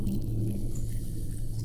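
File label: Music Dpad Evaporate 145; from dust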